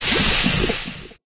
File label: buzz hit, anomaly; Soundscape Overhaul